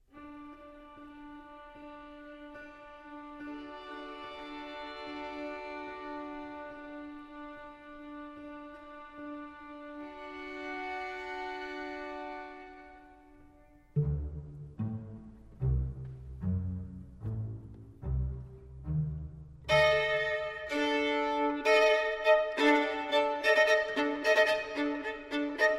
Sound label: saintsaens, les violons, romantic